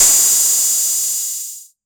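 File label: TR606 Hat Open, Roland TR-606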